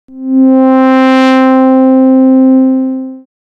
Dream Brass; instruments

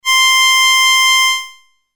Harmonica

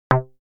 Shorty, synth lead

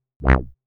Wah, synthlead

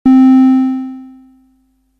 Tristar, synth lead

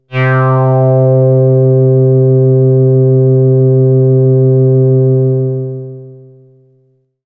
Sun-Sigh A, synthpad

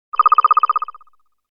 Swamp animal

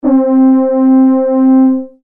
Brass pad; instruments

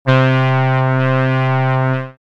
synthlead; darkplanet; Wide2

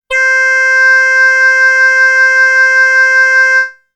Oboe B

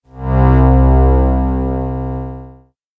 Triton Pad, synthpad

